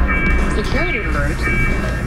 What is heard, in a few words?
security alert; Tagged